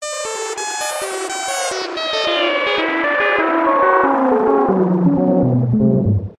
synth space weird synthy sci fi power down shut down